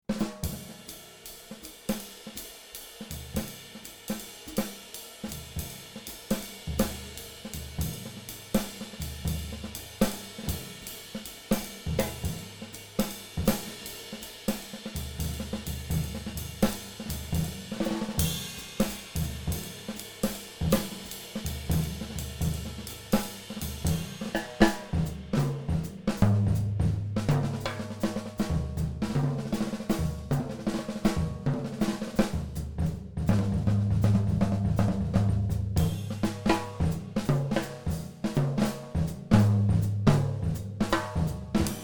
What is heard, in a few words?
drum experiment